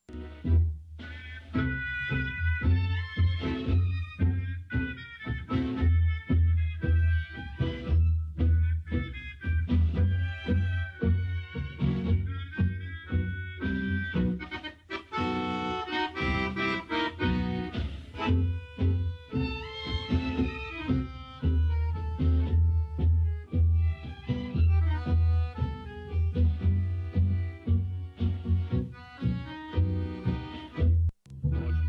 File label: Ukrainian tango; Stepan Huminilovych